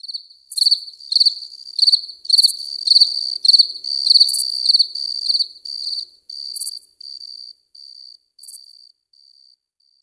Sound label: Grass Cricket